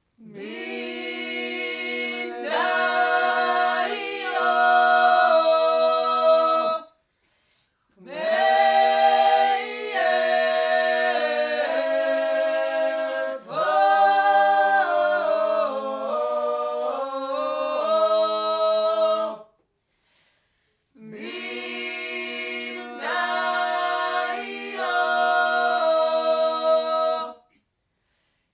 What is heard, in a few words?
Svanish Chant, Ensemble; Have Mercy on Us